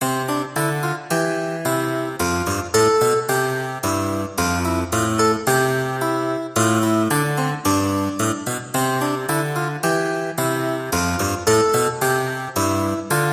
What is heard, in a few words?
Harpsichord Light Classical Theme